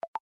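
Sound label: usb effect, infinix phone sounds; telefona infinix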